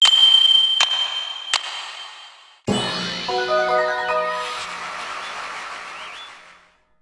full puzzle win

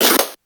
slide; t&t; godot

